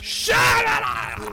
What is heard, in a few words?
shalalala